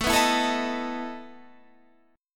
E Major 7th Suspended 4th, A

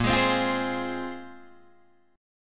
A sharp 7th Suspended 2nd down Guitar DADGAD

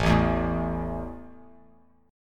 A 5th A down Bass Standard; strummed

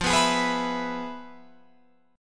F Minor Major 7th Flat 5th Guitar Open F; FmM7b5; strummed

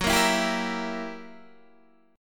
Fsharp 7th Flat 9th down Guitar Open D

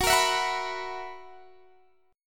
Gb 11th Gb down, chord; Gb11 strummed; JGuitar

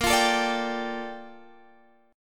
A sharp Major 13th, chord; strummed; chordshape; 18,20,19,19,20,x

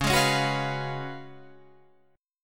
Db 11th Db, Guitar, Drop D

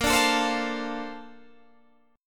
Bb Major 9th, Bb

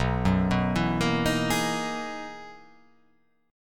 B Major 13th B down

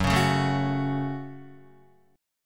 F sharp Minor F sharp, Guitar; strummed; JGuitar

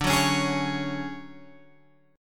D Major 7th Flat 5th, D, down, Guitar Open G

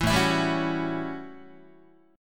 A 13th A down Guitar; strummed

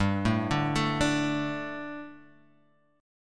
D 5th G; D5/G arpeggiated; JGuitar

